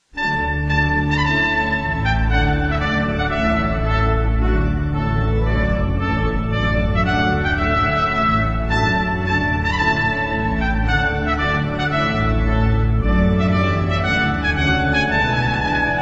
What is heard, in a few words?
Purcell Trumpet Tune; Trumpet Voluntary in D Major